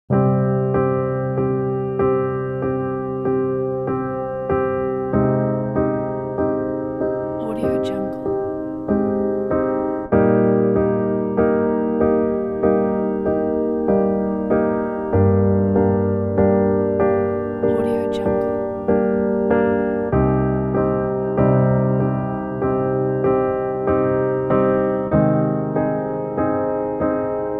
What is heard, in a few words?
documentary